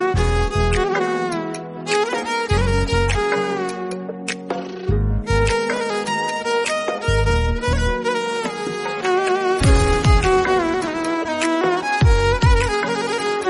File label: Instrumental Violin